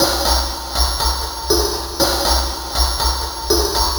Baladi Beat; Sonic Foundry (Sony Creative Software); Loop Noir

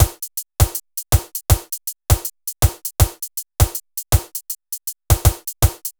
Synth Loop, BPM 80

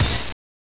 Crash, Genesis; TMNT; M8

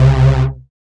synth chorus, dance synth; Sound & Vision - Gigapack I CD 2 (Roland)

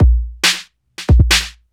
drum 138, Techno, drum-loops

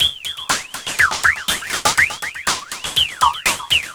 Drum Fx Loop, Electronica

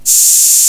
HH OP FX, Big Beat Samples; Big Beat Samples & More; AKAI; HHMIDFX KIT

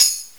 Tambourine Drum; jazz jackrabbit 2; M8

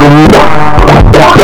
VOX FX; Big Beat Samples & More

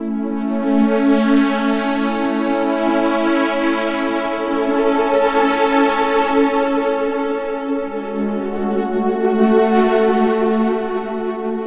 Pad, Chillout; Sonic Foundry (Sony Creative Software); Afterhours EDM; Chillout Service

